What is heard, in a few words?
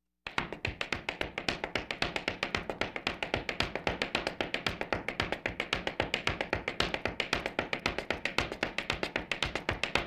Laugh tracks